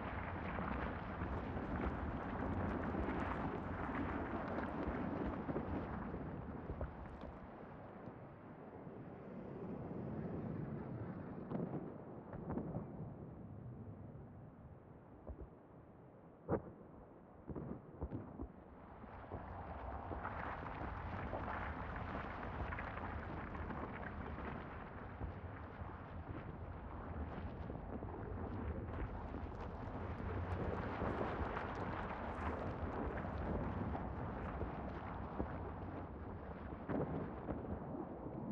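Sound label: interior room; Titanfall 2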